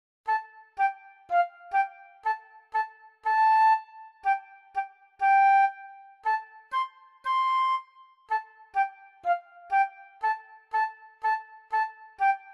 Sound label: Little Lamb flute; pixie hollow; animal rhythm